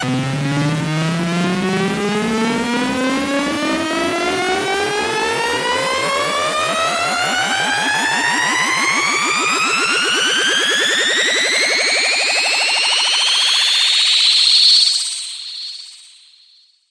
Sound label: club risers